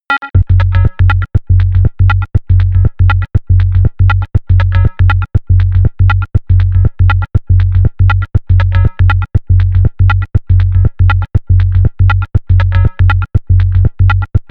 level music

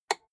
rotary control single turn hard

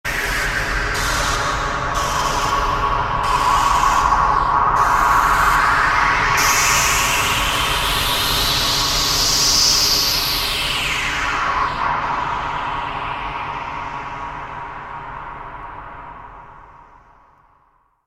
big room noisy pulses

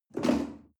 chair wood take slide hard

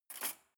cutlery cake fork drawer place

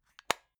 plastic remote battery compartment close

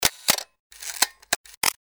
camera klick and load

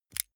lighter electric switch flame on gas

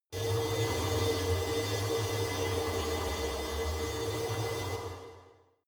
space alien fabric working

